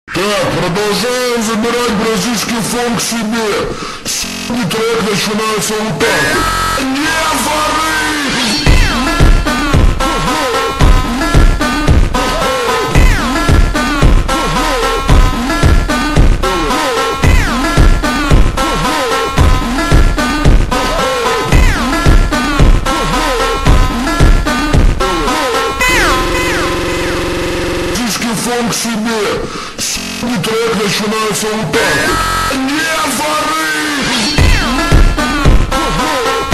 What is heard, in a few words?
funk slowed reverb